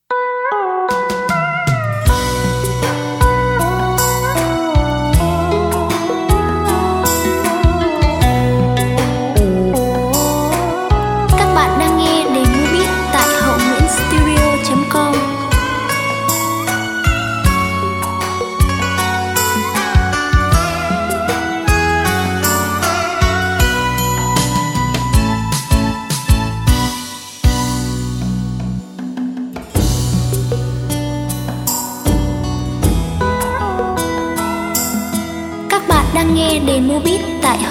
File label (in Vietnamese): Do doc beat